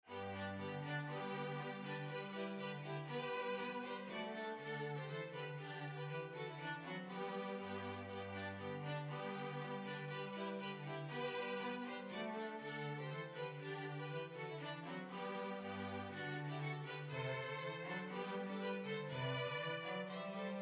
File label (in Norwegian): Skotsk